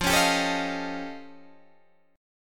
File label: F Augmented 9th F Guitar Open D, chord